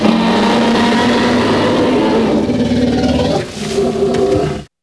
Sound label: Trex Growl; Dino